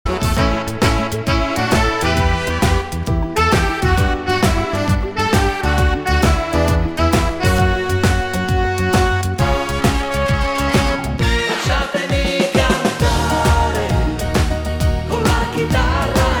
hully gully

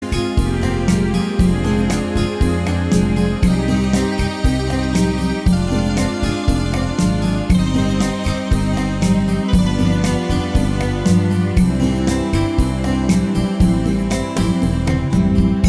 beguine

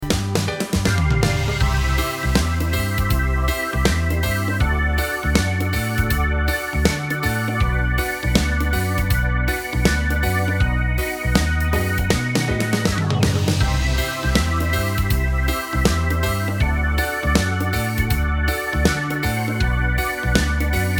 reggae

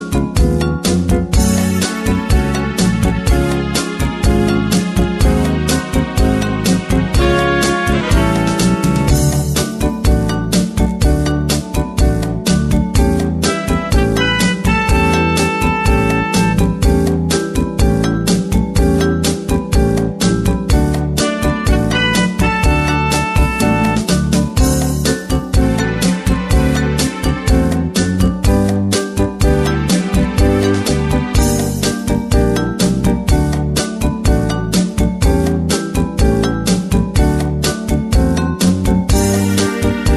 cha cha

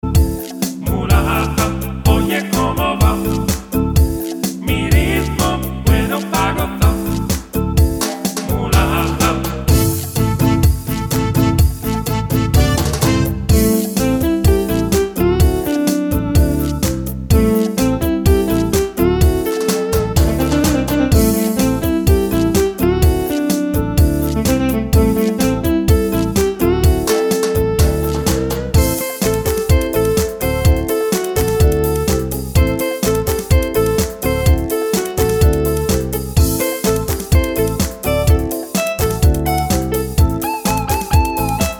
cha cha latino

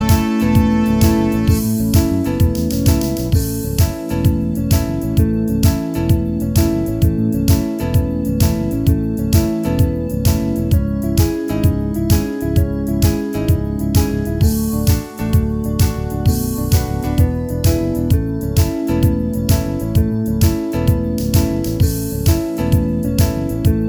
hully gully

